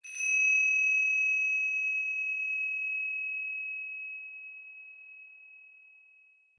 Metallic Rand; Warframe; Clan Dojo; Dojo Randoms; Ambience

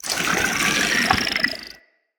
Infested Fishing Fish Caught; Weapons; Infested Fishing Spear

